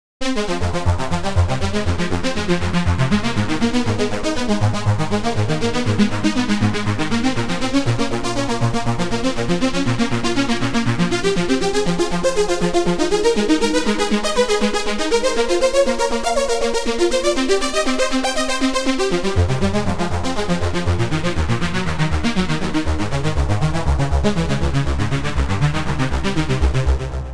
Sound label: arp; the space soundset; hydrogen